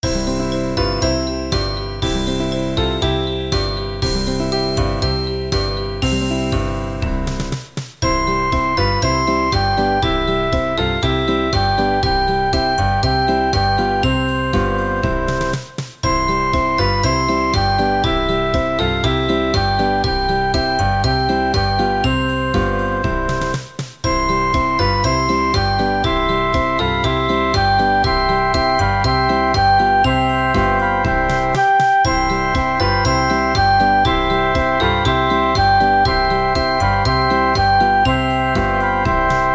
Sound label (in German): kanon playback; zirkuslieder kommt herbei